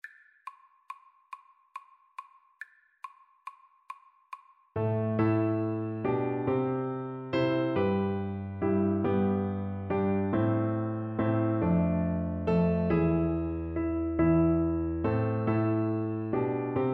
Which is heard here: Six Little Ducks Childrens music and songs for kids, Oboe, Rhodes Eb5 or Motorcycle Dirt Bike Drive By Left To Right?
Oboe